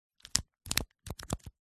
book folder plastic wallet style pages flick through